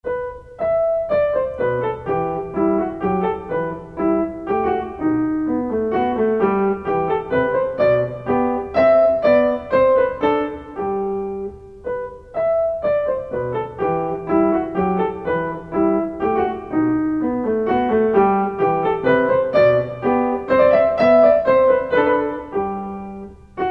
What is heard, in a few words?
Minuet in E minor; Marlais Book 3